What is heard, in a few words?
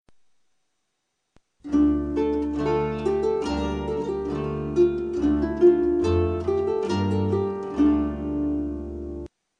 The Guitar Lightly